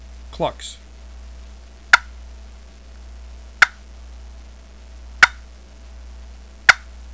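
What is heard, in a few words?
clucks, turkey call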